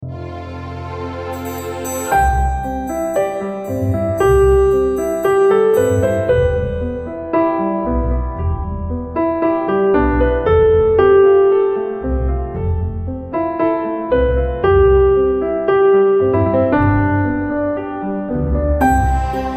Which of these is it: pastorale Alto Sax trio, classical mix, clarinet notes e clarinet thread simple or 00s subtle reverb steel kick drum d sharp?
classical mix